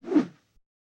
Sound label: fighting air movement; soundscrate